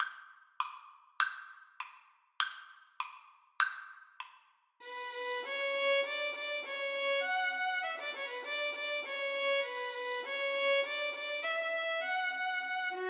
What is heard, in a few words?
violin duet